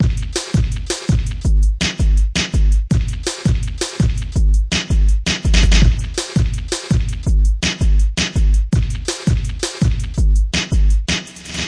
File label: 164 twotone basic dnb, drum loops and breaks; 161 to 180 bpm